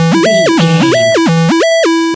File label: retro synth beeps